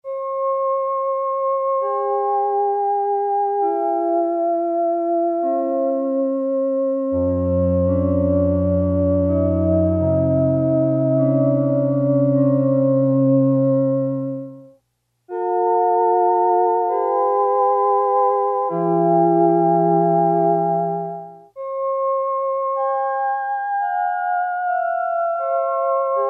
human voice; Tones

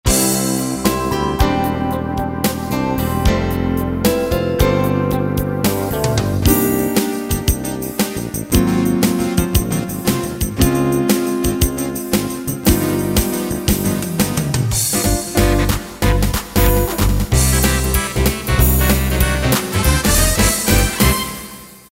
50's & 60's, Roland EM-10

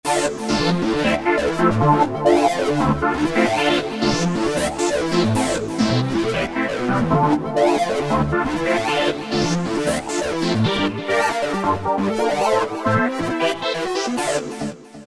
Goa Trax; Factory Patches

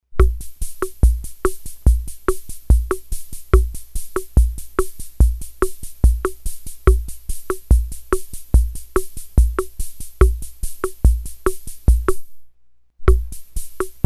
BOSSA NOVA, Ace Tone Rhythm Ace FR-2L

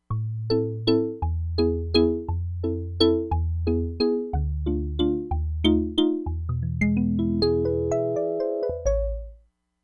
SPILLO TRILLO, Crumar Bit 99; Factory Programs

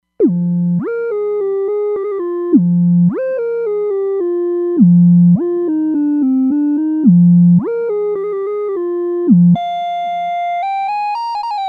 Peak Arp Sine, Roland Fantom XR

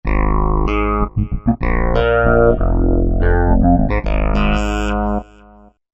Thoaty Bass, Roland V-Synth; Factory Patches

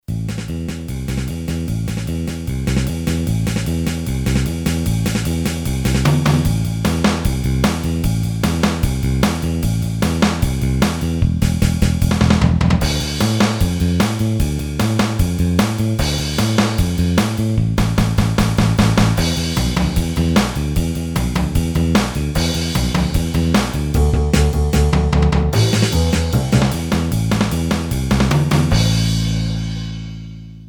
SURF ROCK, Boss DR-3

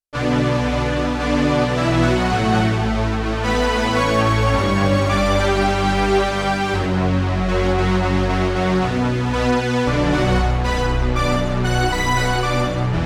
Monster 4 Voice, Analog Strings, Yamaha TX16W

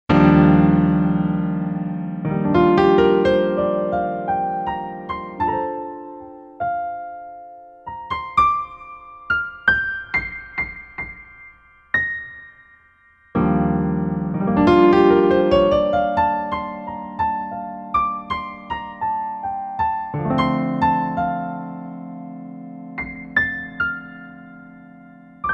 Stereo Piano, Roland SRX-07; Roland SRX-07 Ultimate Keys

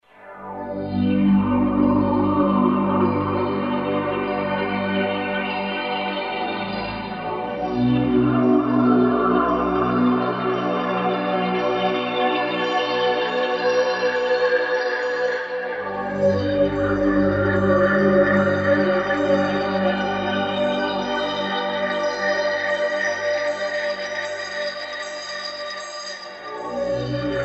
Tranquil Sleigh; Kurzweil K2000 Orchestral ROM

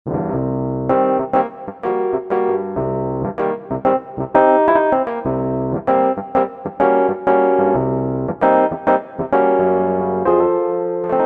AMB ROAD HI, Old School Keyboards; Universal Sound Bank; Soundscan 09 Old School Keyboards

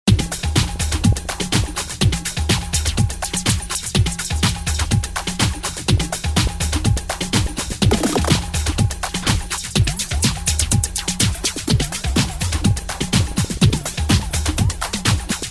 Jungle 124; Roland M-DC1

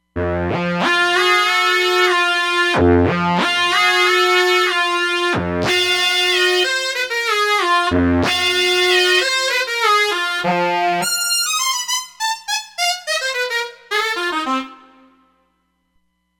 Bag Lead, Roland Fantom XR

